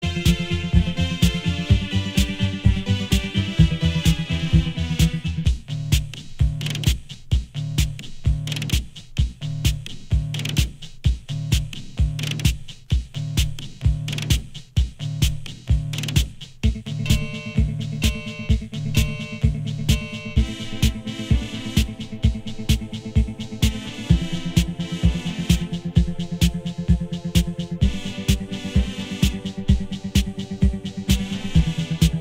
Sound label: italo synth; experimental